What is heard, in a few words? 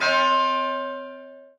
church bell